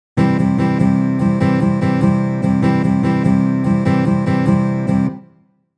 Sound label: stroke, guitar